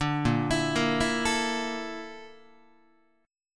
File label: A sharp Major 7th Flat 5th A sharp Guitar; A#M7b5; arpeggiated; JGuitar